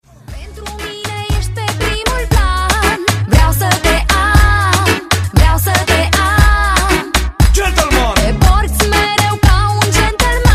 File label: iphone 12 pro max ringtone